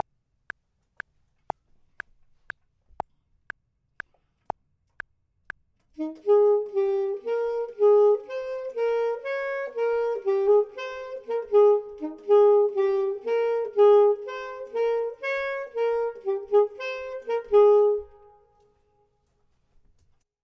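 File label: top, Saxophone and Clarinet; voxman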